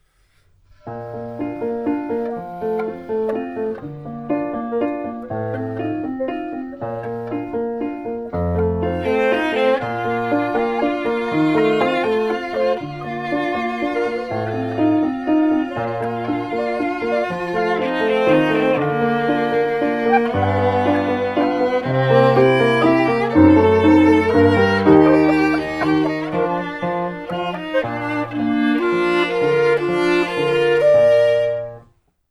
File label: mozart backing